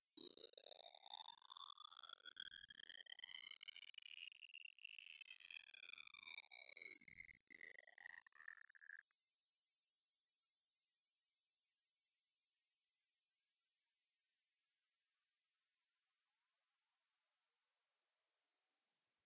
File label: flashbang ring; ACE3; Arma3; Ported the ACE2 ear ringing logic